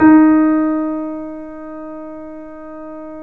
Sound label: 3D Pianos Yamaha C7; E-MU Producer Series Vol. 5